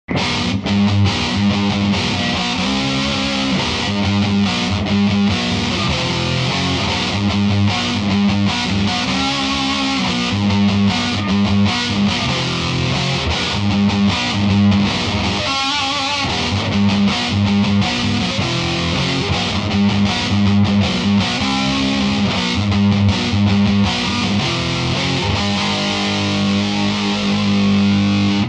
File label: badcat double gain